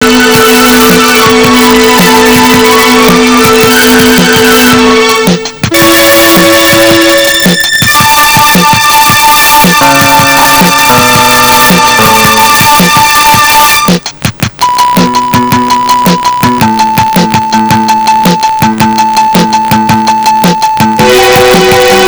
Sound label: concerto